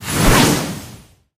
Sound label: list appear; ranked brawler; Brawl Stars